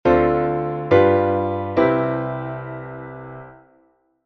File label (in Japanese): 3音ボイシング